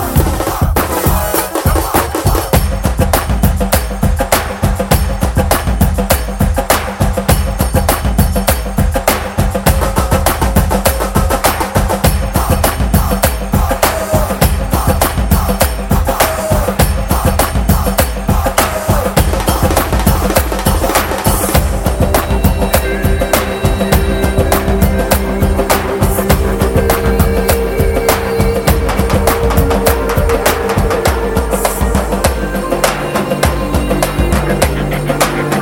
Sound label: Beats